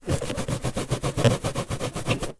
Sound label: foley balloon inflated rubbing against object or wall